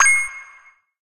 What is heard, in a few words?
coin